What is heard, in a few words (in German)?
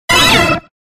Ruf